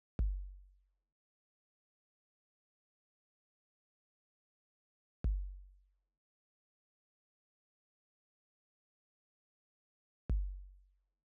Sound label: Sirens